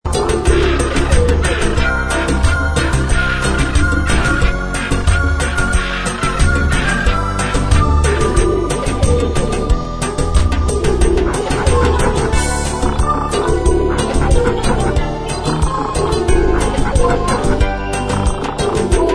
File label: blues